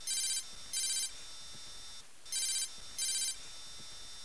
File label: ringing ringtone; gta iv